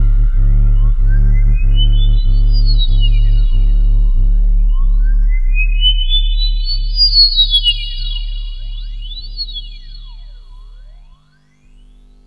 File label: LARSEN ECHO; USB Soundscan vol.27